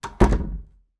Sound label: door close gently internal wooden